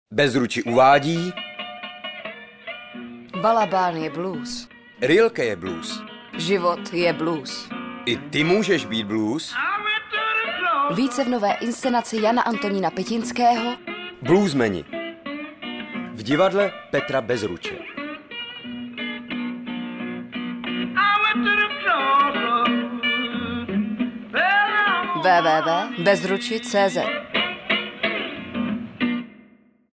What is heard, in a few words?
Blues